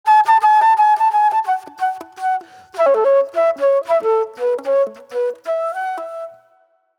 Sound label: Mellow Soul Flute